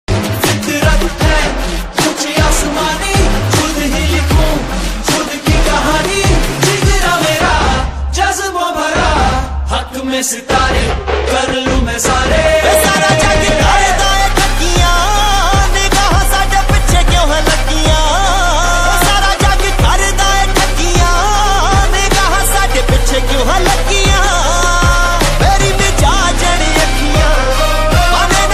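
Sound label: background music ringtone; kalki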